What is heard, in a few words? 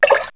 Drop, perc fx; X-Static Goldmine